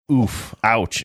"oof ouch"

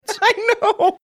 laughing saying i know

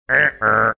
mimicking uh oh drop

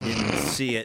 snorting reaction

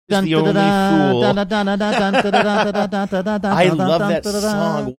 singing studio fanfare

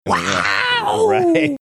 doing what he thinks is scream

